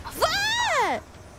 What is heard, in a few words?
FUUU-